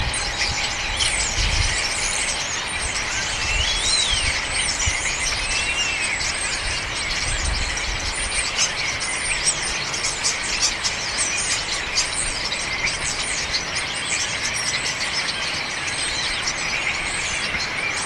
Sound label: Birds